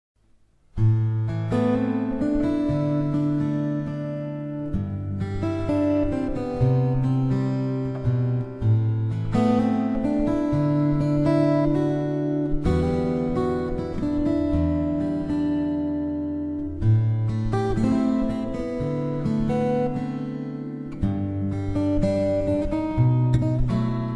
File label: fingerstyle